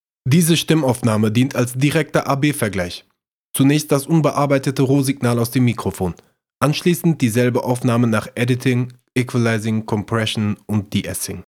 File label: vocal processed